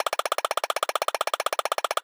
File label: Wheel Spin